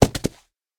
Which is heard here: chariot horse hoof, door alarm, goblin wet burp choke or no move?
chariot horse hoof